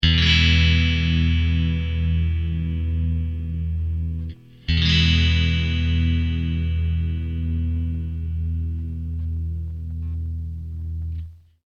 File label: clean bridge coil; smoke stack ii